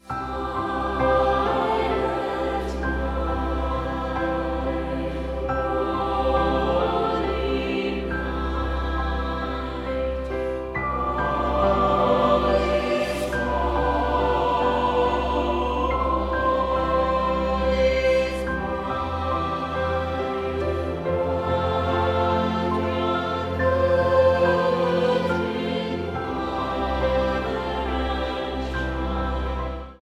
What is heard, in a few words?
Silent Night Ringtone